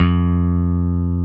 BASSES, Roland

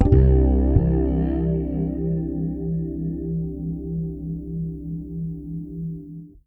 FRETCHORD C, PEDULLA BASS; Masterbits CLIMAX 7 - Bass

